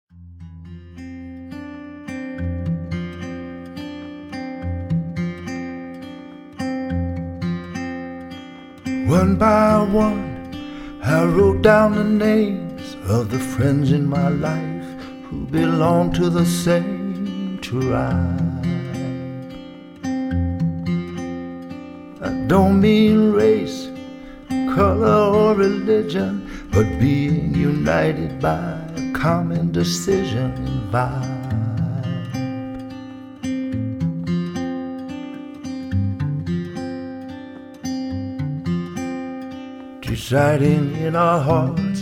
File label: Victory Voices